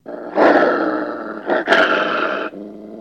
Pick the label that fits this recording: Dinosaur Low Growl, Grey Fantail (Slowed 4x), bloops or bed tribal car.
Dinosaur Low Growl